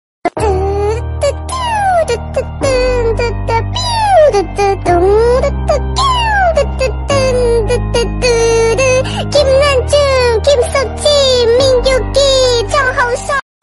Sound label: Dudada Dudada funny